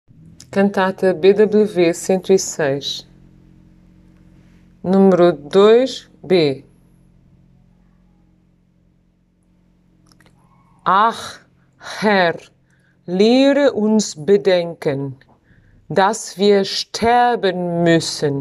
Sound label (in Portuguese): Bach Texto Alemao Solistas; natal 2019